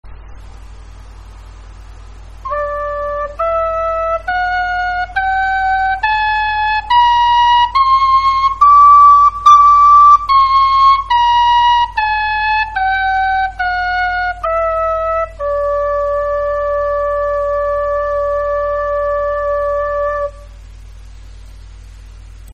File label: gamma, whistle